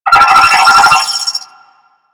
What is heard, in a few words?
Space Drone Attack E; Warframe; Corpus Space Drone